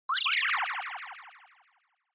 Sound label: Motion Slide Delay